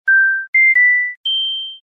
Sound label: Oven Power On